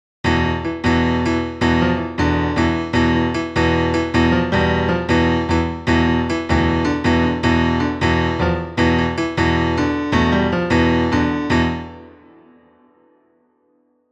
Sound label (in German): phrygisch